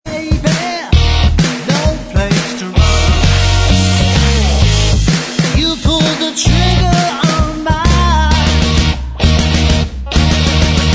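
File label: backing vocals track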